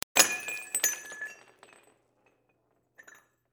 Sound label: Candle Sticks Break